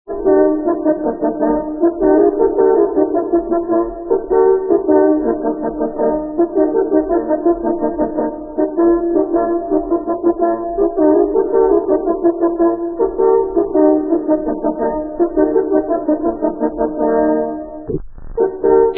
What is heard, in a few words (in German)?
Leitsignal Gute Jagd, Jagdhornblasen; Signale; Brauchtum